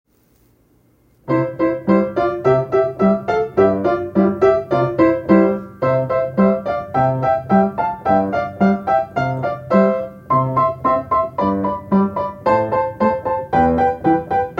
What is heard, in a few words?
Sixths Etude